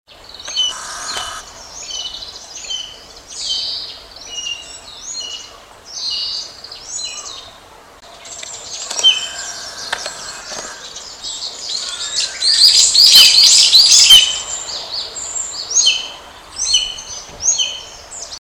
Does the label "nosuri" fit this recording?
Yes